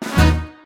c near Win; willyshotchillies

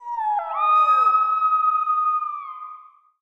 howl; the wolfs night

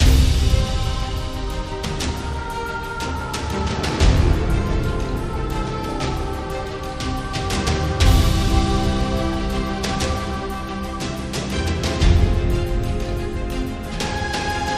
freespin Intense Ambience; godsoffortune

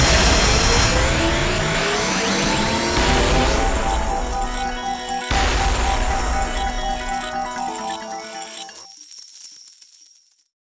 big win; eggomatic

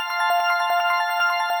count up loop; spacewars

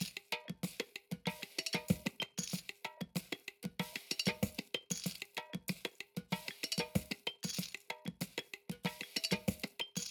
spin perc; spinsane

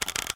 spin loop; gonzosgold

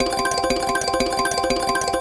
count Up; gonzos gold

